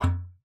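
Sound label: F3, bass flute; Xsample VOL-2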